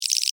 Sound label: Insect Voice Chirp, Vocalization, Bug; Animal